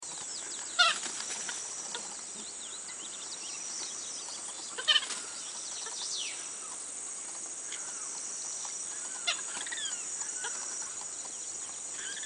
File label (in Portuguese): canto do frango dagua azul